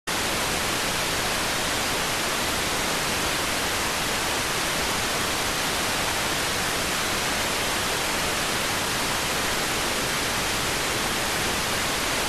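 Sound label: radioteletype receive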